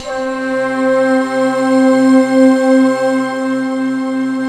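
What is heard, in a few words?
Japanese flute orchestra, New Age Jam; Optical Media International; Sonic Images Library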